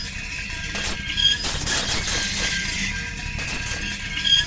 shoulder rail scrape loop; Blind Drive

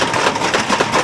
Armour Rattle; SFX Kit; Creaks, Scrapes, & Misc

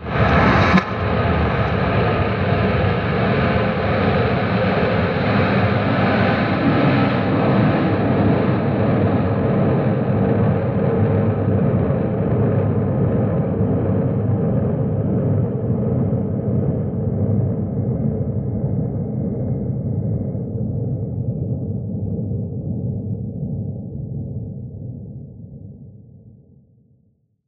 Long Master Filter; Cinematic Metal Impacts

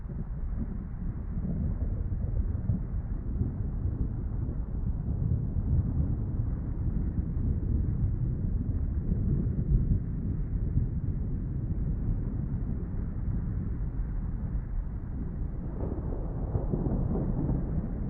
Battlefield; AudioWizards

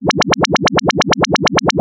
Arcade Movement, Video Game Sounds; 1001 Sound Effects

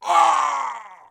voice damage male; Blind Gladiator

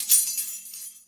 Pickup Key; Pickups

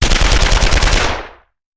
Machine Gun; Sound Ideas; Guns

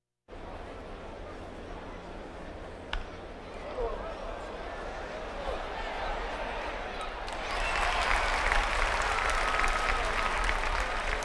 Crowds Race cars Baseball

